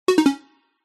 multimedia game sound synth digital three tone beep